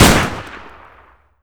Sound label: shoot, weapons; tf2; bigkill